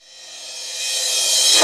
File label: Reverse Crash; Vengeance Samples; VEH2 Cymbals